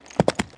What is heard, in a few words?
Horse Walk